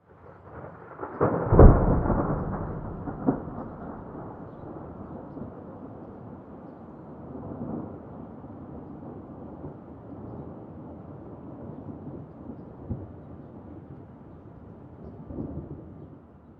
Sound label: Weather Thunder Crack Rumble